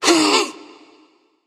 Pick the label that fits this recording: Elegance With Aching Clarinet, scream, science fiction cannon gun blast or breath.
breath